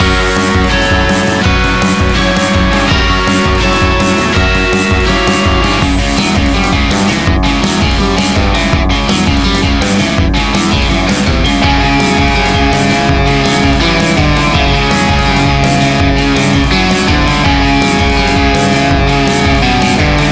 Battle; Dillon's Dead-Heat Breakers; Nintendo 3DS

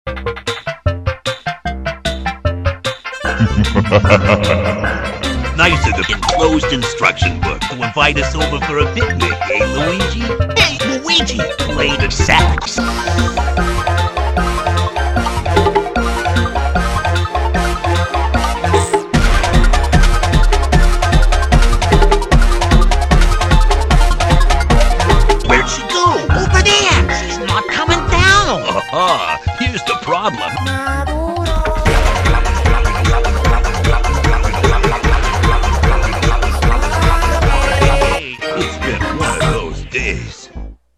play the Sax; Hey Luigi

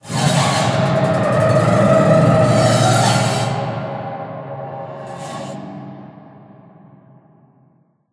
Combat Mix Samples

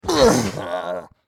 Character Zombie Hit; Angry Birds Epic

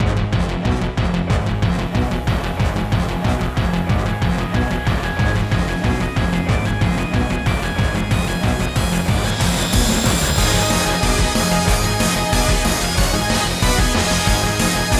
BGM BATTLE; 7th Dragon 2020

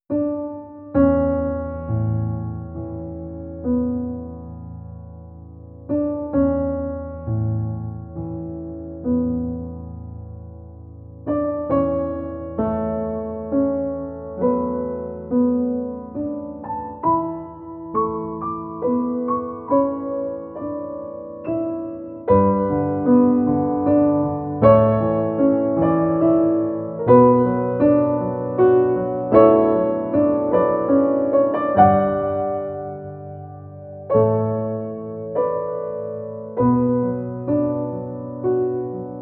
Beyond (Piano); OPUS: The Day We Found Earth

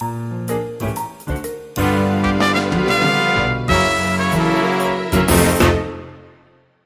Level Failed

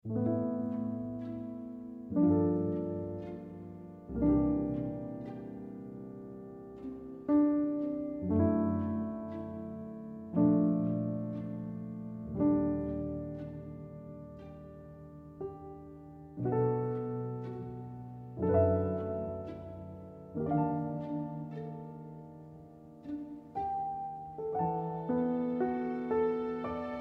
Field BGM; Xenoblade Chronicles 3

